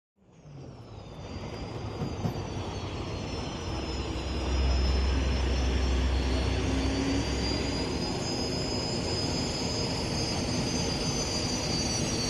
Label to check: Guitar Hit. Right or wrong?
Wrong